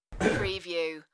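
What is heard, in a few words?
Male Clear Throat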